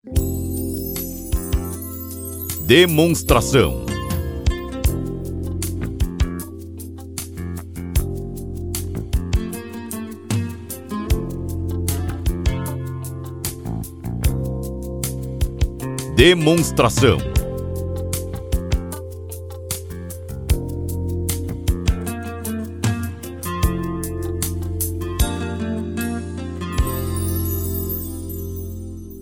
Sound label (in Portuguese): Trilha